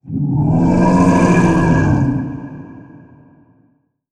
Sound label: monster; MonsterVoiceお手軽パック